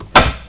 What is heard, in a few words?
latch